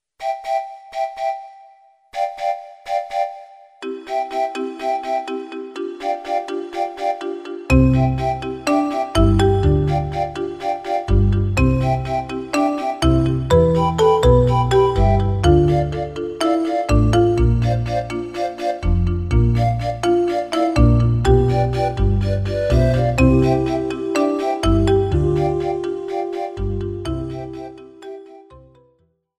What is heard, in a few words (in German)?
musikmaschine